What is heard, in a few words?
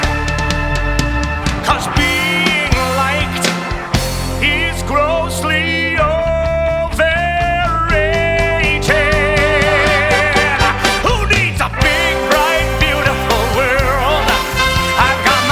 Vocals; Shrek; academy players audition materials